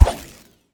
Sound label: Screwdriver Thrust; Wulfrum